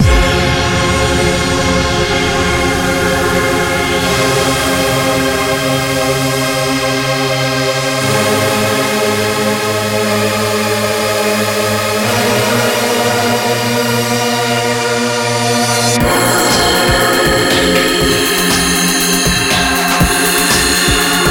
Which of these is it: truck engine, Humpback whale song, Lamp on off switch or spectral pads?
spectral pads